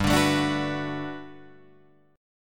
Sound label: G Major 9th, G, down, Guitar, Drop D